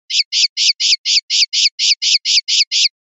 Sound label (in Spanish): rabieta